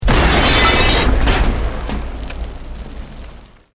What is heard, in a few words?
Explosion Medium Brick Wood Glass